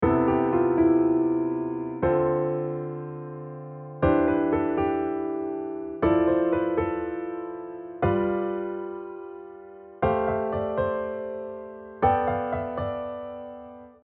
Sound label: 7th sus4